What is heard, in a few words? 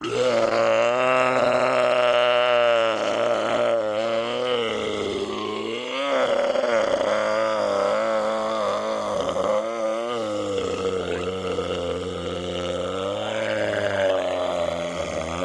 Groany Monster Pain, sea monsters; TheLibrarybyEmptySea; TheLibrarybyMTC